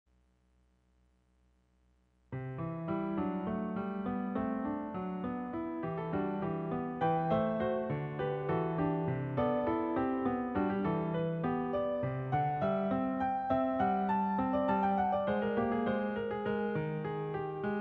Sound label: Piano Prelude